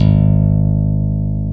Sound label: BS CHAPST, Chapmn Stick